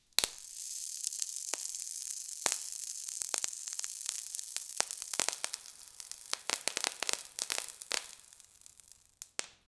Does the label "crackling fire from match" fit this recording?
Yes